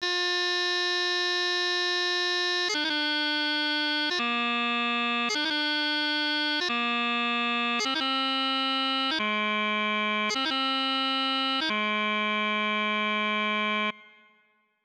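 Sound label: Doublings Exercise C and B Doublings, piping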